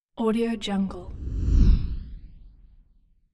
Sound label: rocket pass by whoosh; hollywoodedge